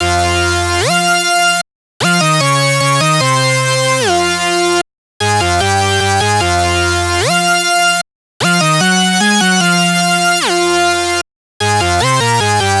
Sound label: Lead Loop F Electro Organ, Dubstep, 150bpm; Ghosthack; Dubstep Sound Arsenal